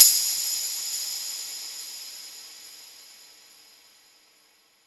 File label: drum hit whistle; AL Ayanami by [Winter]